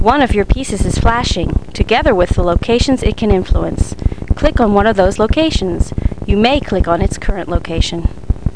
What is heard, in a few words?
click